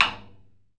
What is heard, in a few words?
Surdo rimshot; E-MU Producer Series Vol. 2; More Studio Essentials; Composer